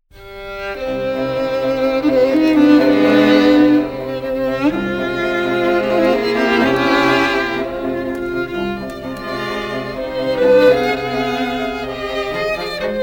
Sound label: strings; beethoven; mastered